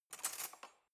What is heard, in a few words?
cutlery knife drawer grab